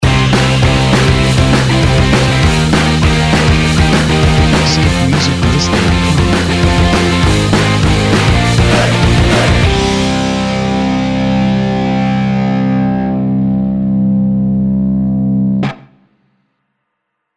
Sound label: Upbeat Indie Rock Intros